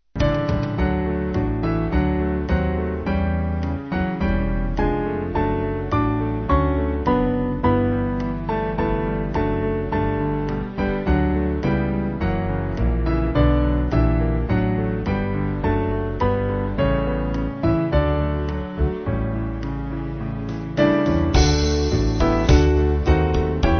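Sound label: Band, Music Backing Files for Churches; I Heard The Bells; Waltham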